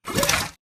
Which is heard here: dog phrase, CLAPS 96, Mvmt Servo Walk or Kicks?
Mvmt Servo Walk